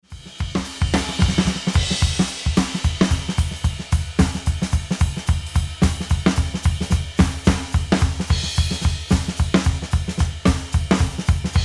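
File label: Balance Drum with Ambience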